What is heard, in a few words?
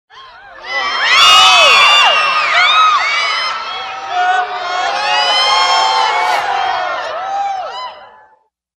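sounds of cinema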